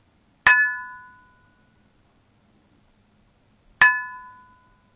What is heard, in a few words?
glass